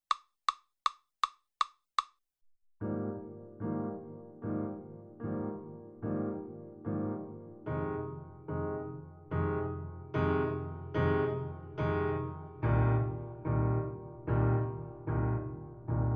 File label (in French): accompagnement